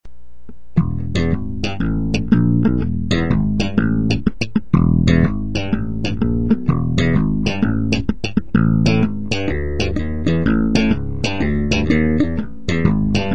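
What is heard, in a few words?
warwick slap, bass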